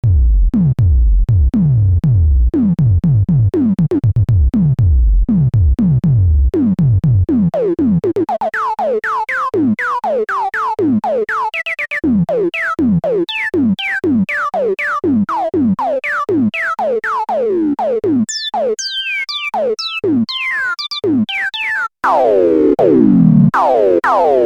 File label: waldorf microwave xt filter lpf12 q100